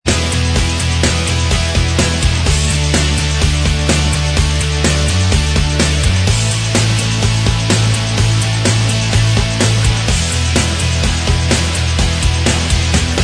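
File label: instrumental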